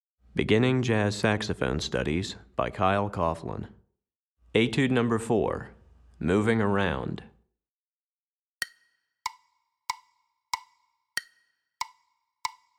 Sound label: Tenor Etude, Saxophone; Recordings For Beginning Jazz Saxophone Studies for Tenor, Volume 1; Etude #4; Moving Eighth Notes